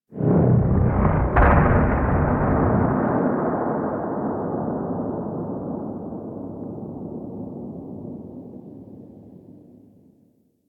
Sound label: Ext Distant Seismic Explosion, Gas City, Ambience; Warframe; Corpus Gas City